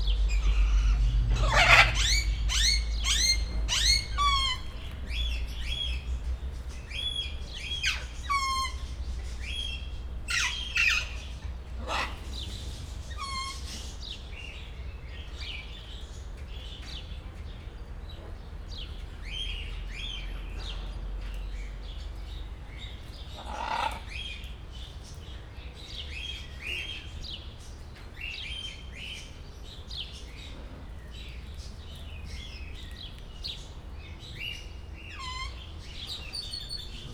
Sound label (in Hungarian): balfelé kissé nyávogós, sárga bóbitás kakadu; Győri Zoo 2014